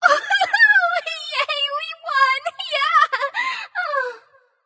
yay light; smite; voicepack; hel

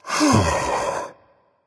content berserker; cuchulainn

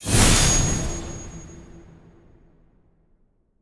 idle orb conjure large; smite; olorun

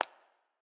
shoe tap; Smite; Baron Samedi

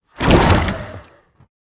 cyclone; smite; poseidon

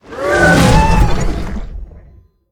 fire; Smite; Zhong Kui

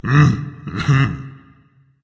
agree berserker; cuchulainn

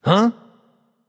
loud sound; smite; voicepack; osiris

